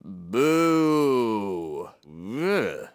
Man Booing; Zomboid; Lifestyle

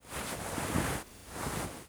Pump Open; Zomboid; P4PumpPury

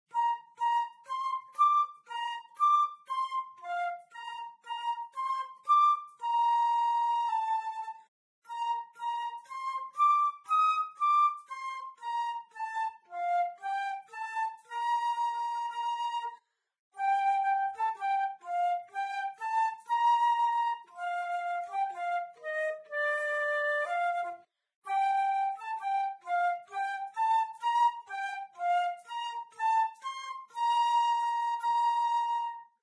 Flute Yankee Doodle; Instruments; Zomboid; Lifestyle

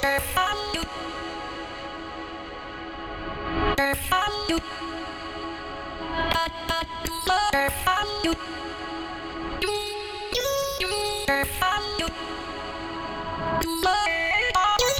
lofi; DJ Booth; effects

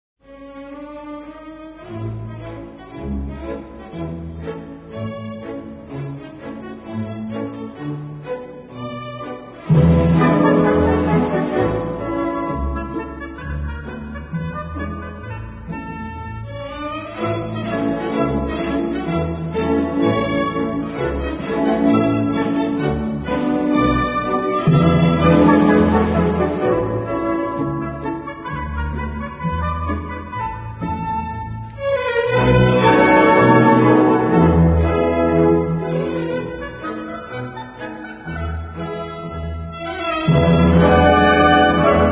Classical; Zomboid; Lifestyle

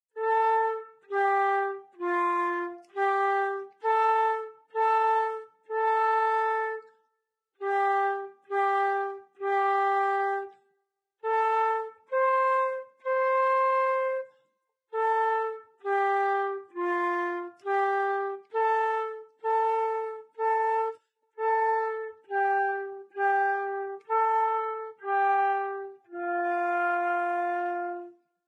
Flute Mary Had A Little Lamb; Instruments; Zomboid; Lifestyle

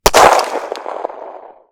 Firearm 1911 Shot; Firearms; Zomboid

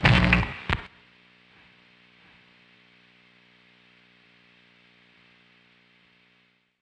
Guitar pickup; Instruments; Zomboid; Lifestyle

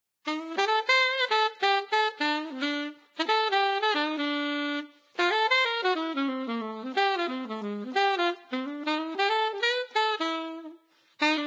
Saxophone; Zomboid; Lifestyle

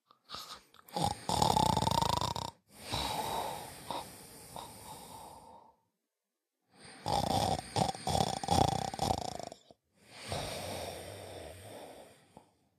snore; Zomboid

